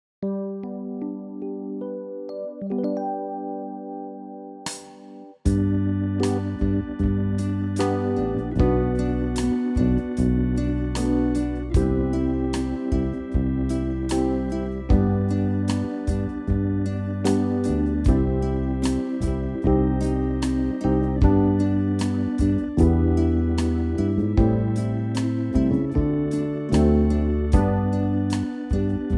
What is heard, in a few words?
backing tracks alto, saxophone; Course #1-Part Two: Mini Course For Easy Music Notation Reading & Technique